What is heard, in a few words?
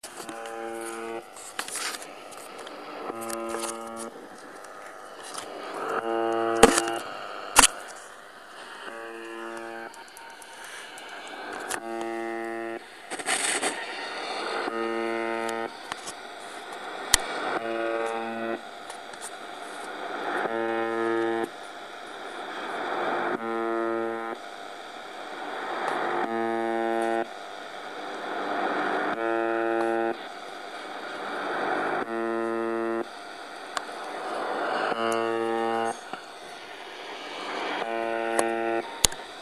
Buzzer